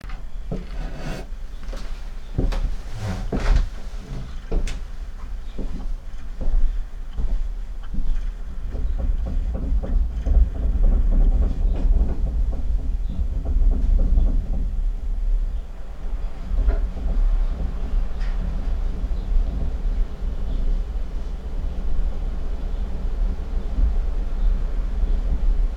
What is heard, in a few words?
Assorted House Noises; Richland WA